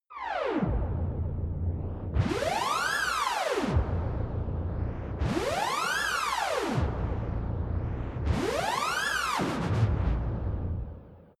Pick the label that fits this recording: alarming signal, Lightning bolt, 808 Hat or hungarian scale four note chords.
alarming signal